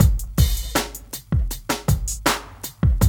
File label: BREAK